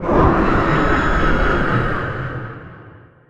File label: Wyrm Scream